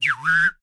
WHISTL, ANDES FX World Voices; Spectrasonics Vocal Planet

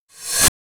808 & 909 Kit REV CRASH; Roland Juno-D

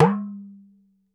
Talking Drum tone; E-MU Producer Series Vol. 7 – Old World Instruments

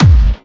Clubby Kicks, Bassdrums

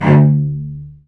SPICCATO, Spiccato Celli

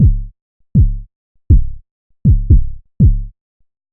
Woofa Bass Drum; Sonic Foundry; The Electronic Music Manuscript Collection; Drum Loop Structures

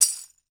BRASS ANK, TREE BELLS; Voice Crystal - Steve Reid Definitive Percussion

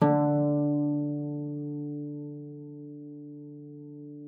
C#3, harp forte; Xsample VOL-8

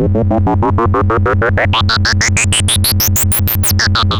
MOOG A#3, Synthesizer; Hollywood Edge; Giorgio Moroder Rare Synthesizer Collection; MLTI.MOOG

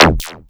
OB FX; Masterbits; Vintage Voltage; SEQ-FX

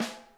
RIM, snare; Sonic Reality; Interactive Drum Kits

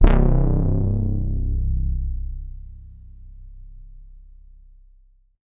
C2, Synth Line; 90 s Sample CDs; NiceBeats; SynthLine VOL-23

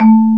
MARIMBA A-2; MALLETS

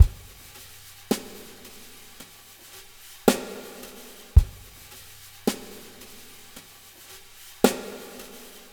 drums; Spectrasonics; Liquid Grooves 1 & 2

